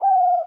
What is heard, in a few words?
Amb Bird-Night Coo